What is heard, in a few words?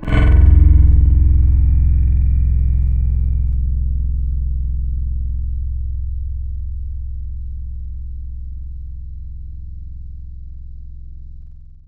World Japanese Guitar; Instruments; Synthetic's Serum Collection; Echo Sound Works Core Tables